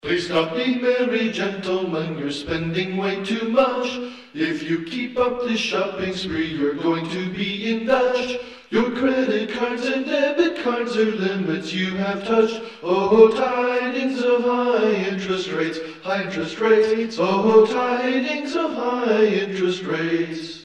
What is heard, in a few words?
Acapella; Christmas